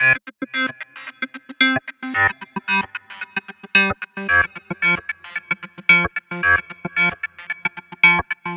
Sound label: 112 C, Melodic Loops; M8; Electronisounds